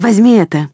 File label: fire, human woman fight; Stalker Anomaly